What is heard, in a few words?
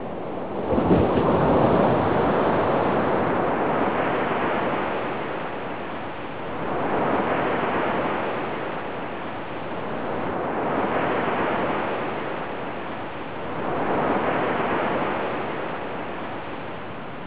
low ocean